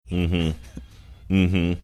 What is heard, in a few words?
mmm hmm mmm mmm mmm hmm